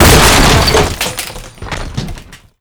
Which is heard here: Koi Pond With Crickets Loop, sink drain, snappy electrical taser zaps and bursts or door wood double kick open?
door wood double kick open